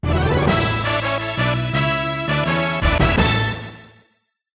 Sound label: impact victory theme